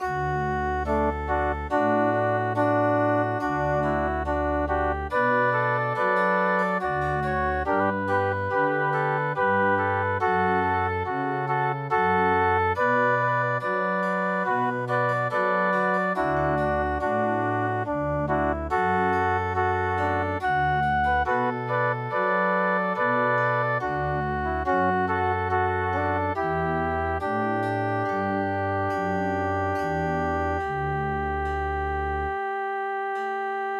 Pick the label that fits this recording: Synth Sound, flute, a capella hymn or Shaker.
flute